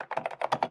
Friend-Typing

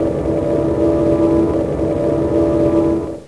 Magic Cast

